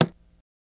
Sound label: Knock On Table Fist; knocks